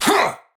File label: Attack Effort, Battle; Warframe; Tau; Dax; Knight